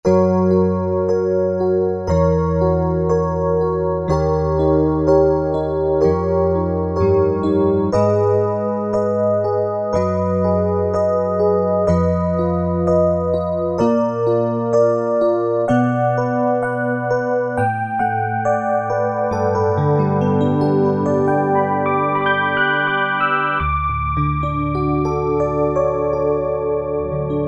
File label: Tine Piano, Korg Wavestation A-D